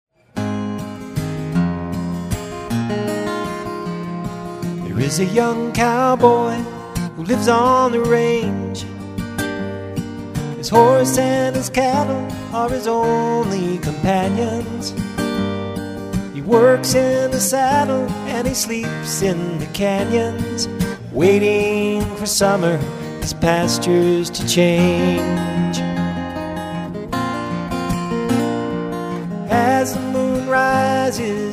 live, acoustic duo

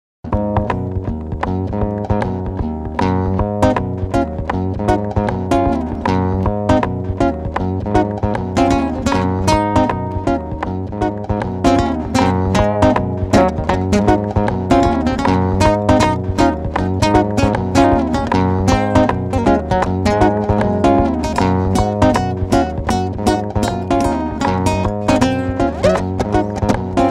Solo Fingerstyle Guitarist; Acoustic Guitar

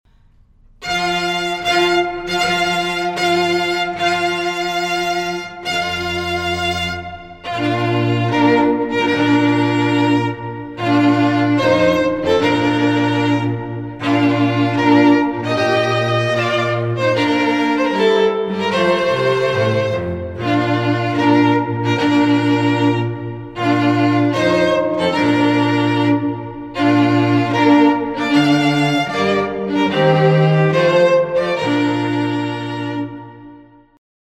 Wedding March, Classical Trio

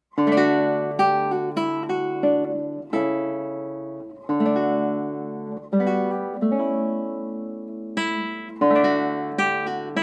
Classical; Minuett II - R. De Visee; Dana Starkell

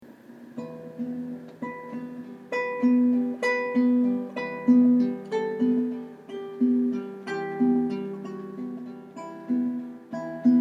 Romance, Classical Acoustic Guitar

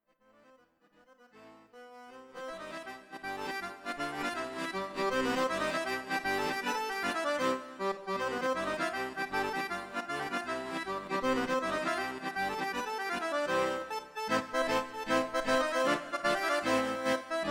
tarantella; accordion

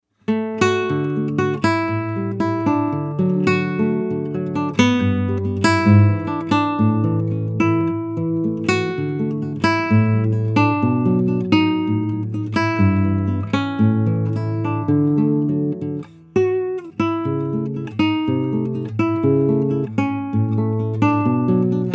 Study in D, Guitar

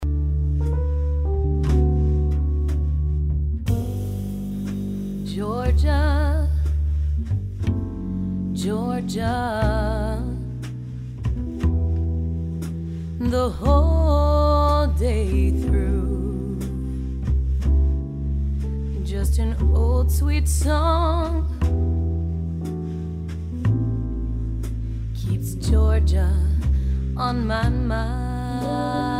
Jazz Band; Cover Band

